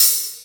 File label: ROOM HAT; Big Fish Audio; Synth City